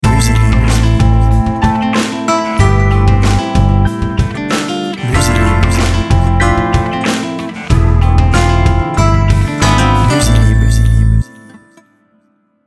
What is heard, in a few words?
latino lounge